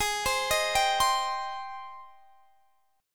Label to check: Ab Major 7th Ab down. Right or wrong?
Right